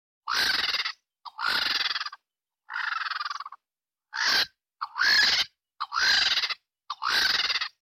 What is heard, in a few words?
lappet faced vulture